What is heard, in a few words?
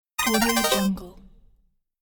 game over sound